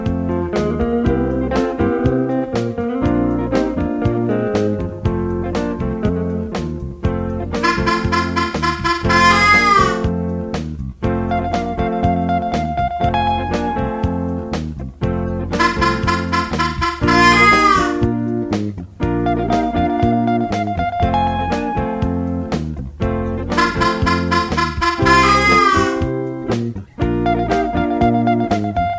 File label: harp echo with guitar